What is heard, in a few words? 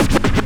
SCRA; XP RECORD-FX; Best Service; XXL Production Effects